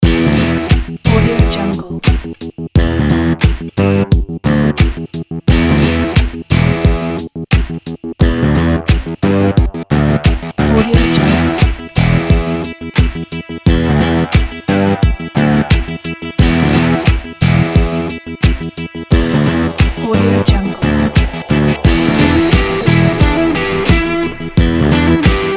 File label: epic rock